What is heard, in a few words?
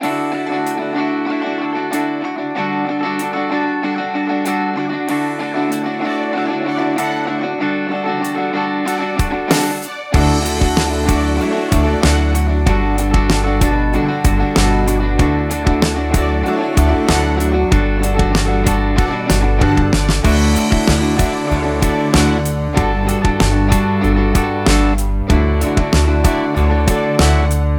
Corporate Motivation; Daruma Audio